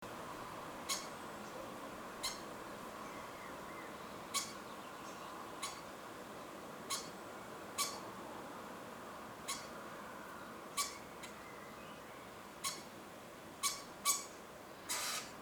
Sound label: night sounds